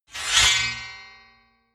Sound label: scrape